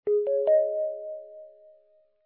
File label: startup sound; onlyoffice; WebStudio; talk